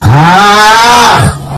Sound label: auuuugh